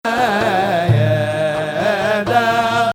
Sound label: Ajam